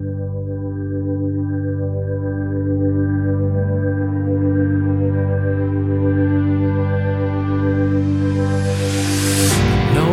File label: Rock Pop Band